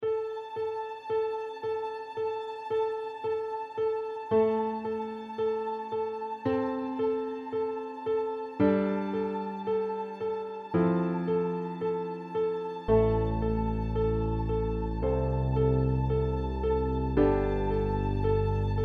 OSCILLATOR Paper Model

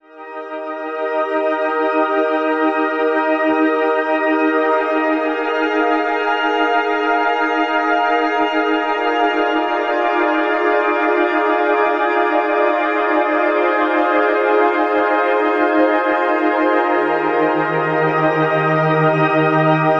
drone; archibald editions

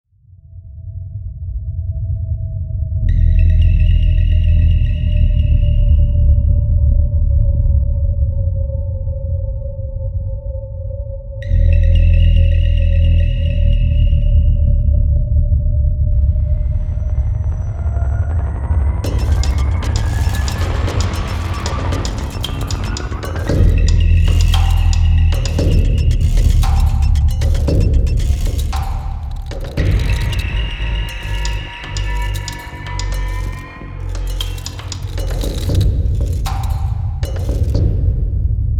UNDERWATER

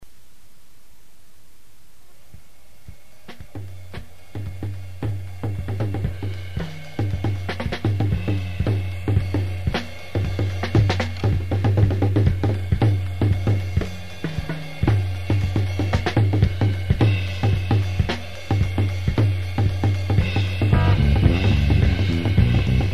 jazz

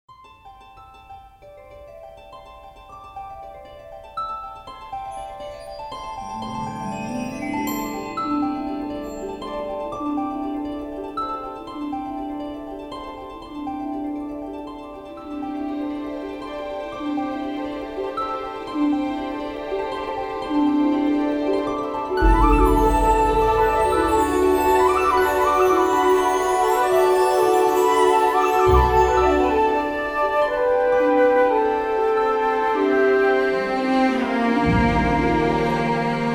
Animation Orchestral Music

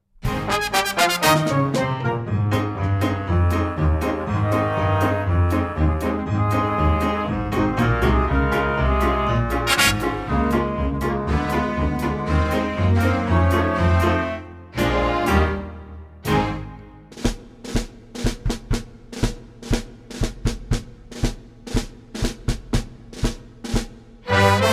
Trombone

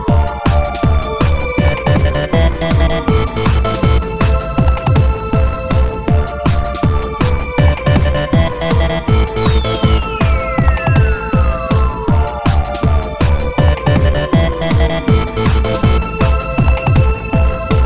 instrumental running workout 160

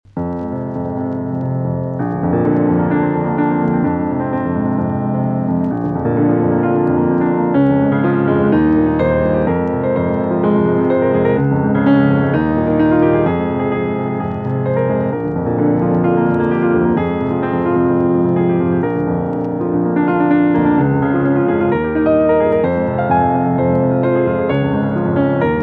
micropiano

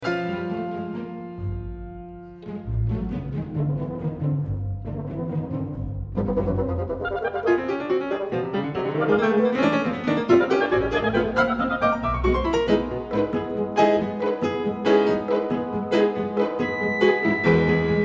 for mixed ensemble

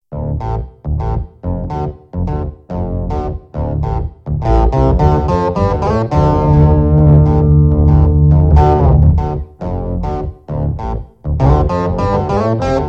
Blues

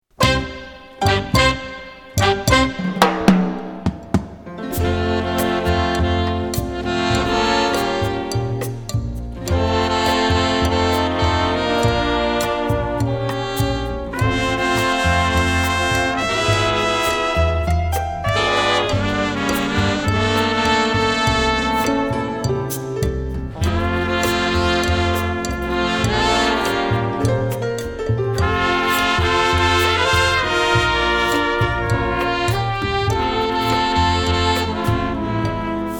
Cha Cha Cha

